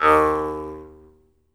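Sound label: Jaw Harps, Pitched Percussion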